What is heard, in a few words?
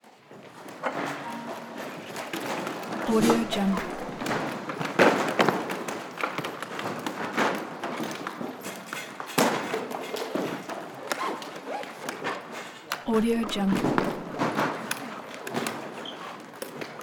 orchestra pack up; blast drive 30; prosoundfx